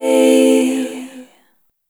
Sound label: Chord A Minor; Sonic Foundry (Sony Creative Software); Pocket Diva World Class Vocal Samples